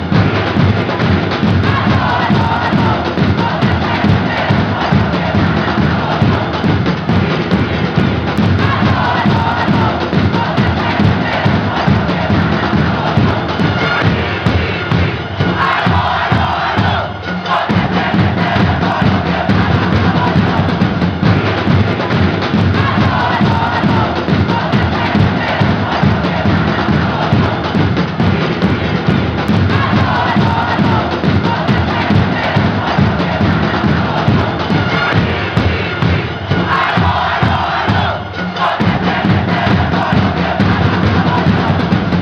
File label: ring ring ring, fanchants, argentina